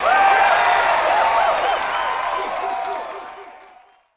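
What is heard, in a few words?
Boxing Crowd Small Cheer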